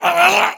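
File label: voice shock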